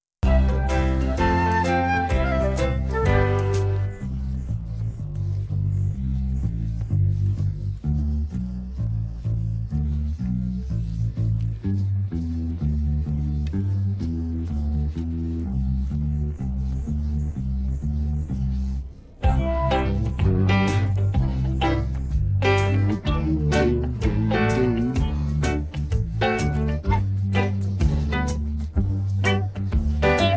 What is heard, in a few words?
songs together music